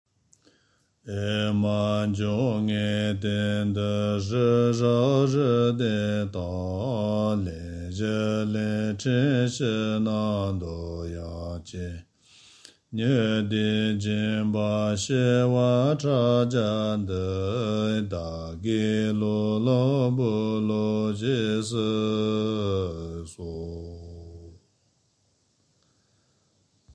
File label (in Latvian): mandala (pirmā melodija); tibetan poetry